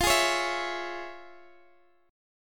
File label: F Minor Major 7th Sharp 5th, F, Guitar, DADGAD; x,x,15,13,16,14